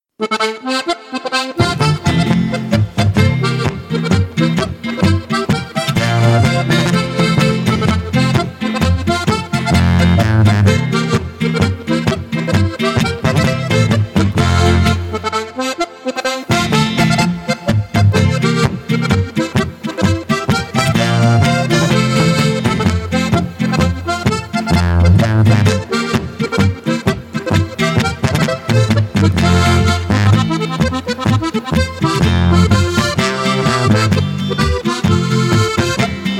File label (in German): Tiroler Bergsteigerpolka